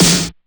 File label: Snare, DIGITAL KIT